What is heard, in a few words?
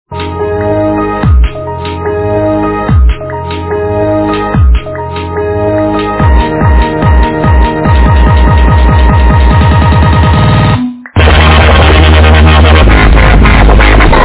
windows startup dubstep remix